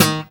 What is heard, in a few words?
GUI Pull D3# Akus; Best Service Gigapack 2